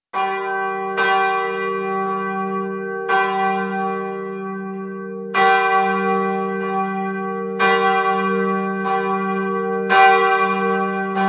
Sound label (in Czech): zvon